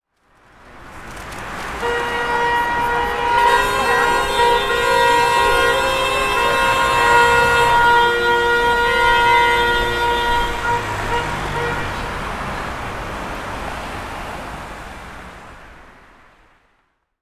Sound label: cars honking sound effect